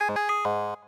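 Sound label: Mutant jews harp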